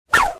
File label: Caesar Salad Thrown